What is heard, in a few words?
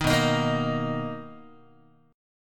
B Major 7th B down Guitar; strummed; JGuitar